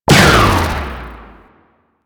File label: Sickle Heavy Combo; Warframe; Lasrian; Lasrian Soldiers; Weapons; Lasrian Butcher Sickle